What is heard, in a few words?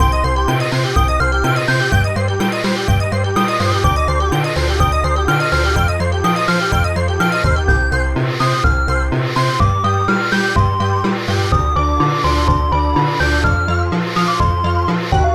fill in